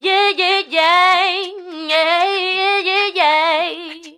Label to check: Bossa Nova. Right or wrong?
Wrong